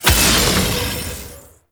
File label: Trapper Boost Grenade Deactivate; Warframe